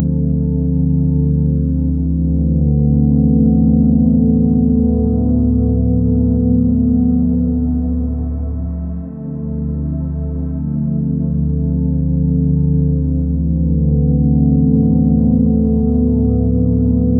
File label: dark ambient drone slow; mood